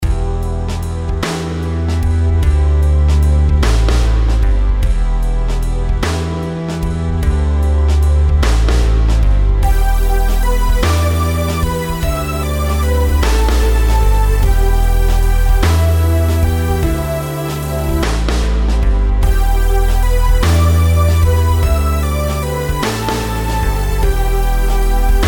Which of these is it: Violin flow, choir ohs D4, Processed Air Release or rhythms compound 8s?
rhythms compound 8s